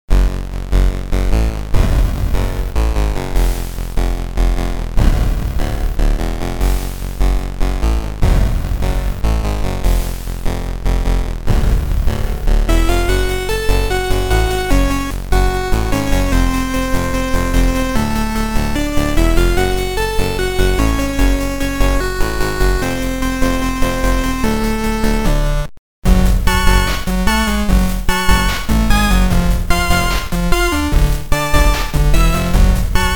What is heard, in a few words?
8-Bit